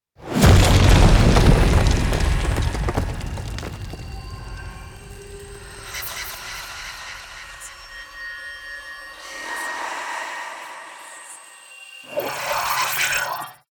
Meteor Crash; Warframe; Cinematics; DuviriQuest; Courtyard

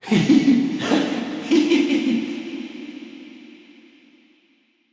Laugh; Alad; Warframe; Wolf Of Saturn Six; Episode Four; Cinematics

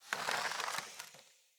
Hunter Bow Ranged Charge Super; Weapons; Player; Bows; EnvoyHunterBowRangedMelee

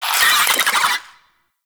Infested Ashen Vocal Attack; Warframe

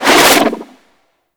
Sentient Rifle Retract; Warframe; New War

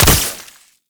Wall Climb Dodge End Point; Warframe; Oraxia

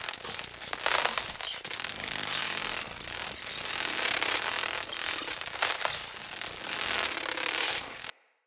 Voltaic Weapon Electricity Active Loop; Weapons; Enhancements; Elemental

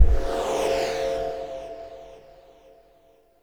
Stinger D; Leverian Dante; Warframe; Vitruvian Museum

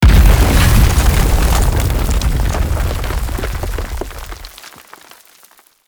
Thumper Bot Spawn Emerge Dirt; Warframe; Grineer Thumper Bot; Movement

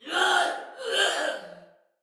Death Soldier Female; Battle; Barks; Dialog; Sinecure Soldier Female B

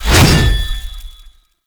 Sword Swipe

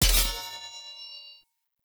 Short Sword Medium Parry Perfect Block Impact; Weapon Impacts; Player; Swords; Combat

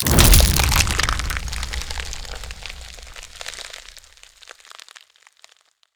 Transform Stinger; Sacrifice Memory Four

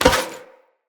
Sphere Sword And Board Catch; Weapons; Swords

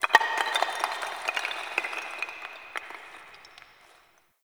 Stone Debris; Ambience; Warframe; The New War; Zariman

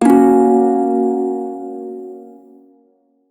hirajoshi chord, shawzin; Warframe

